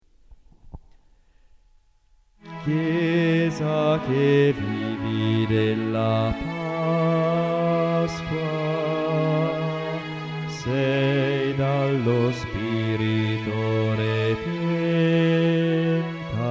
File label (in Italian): bassi